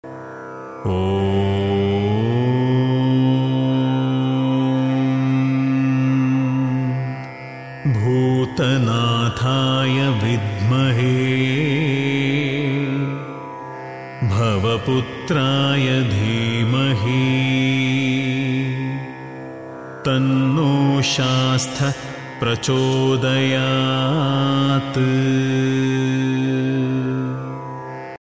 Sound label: ayyappa gayatri